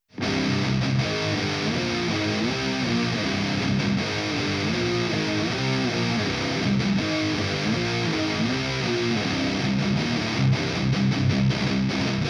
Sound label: old school high gain